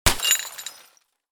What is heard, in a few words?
glass debris crash